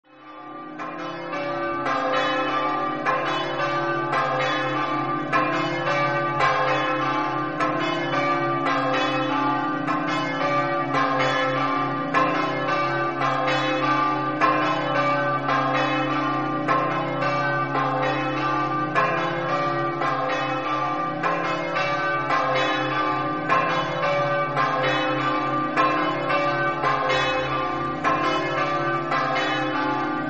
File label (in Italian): liessa, campane